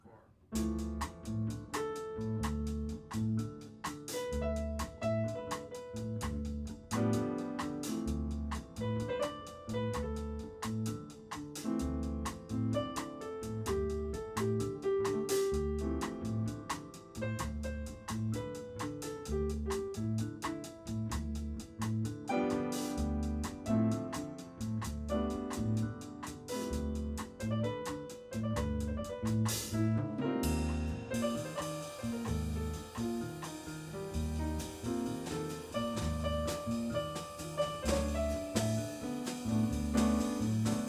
jazz Solos

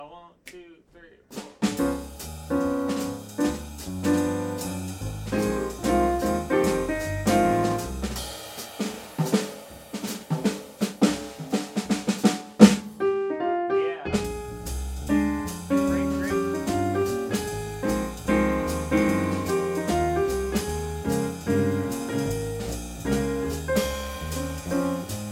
jazz Trading

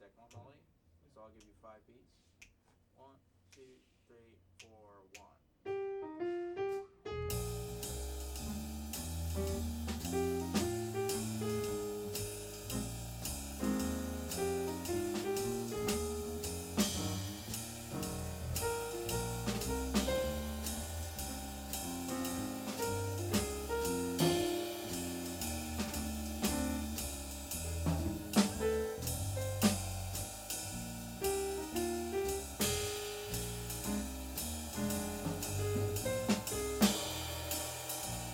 jazz

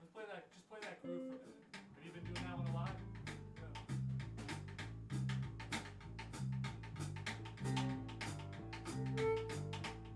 blues, hip Shake

